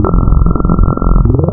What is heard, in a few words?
Vocal; Synthetic's Serum Collection